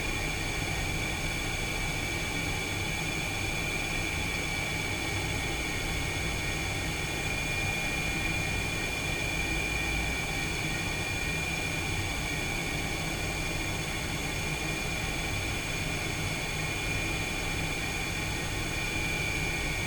Hvac, Heating, Pressure pump, Industrial, Whine, Slight rumble, Loop; Hzandbits; Hvac Elements